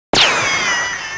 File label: ricochet; gesource; physics; impacts; ricochets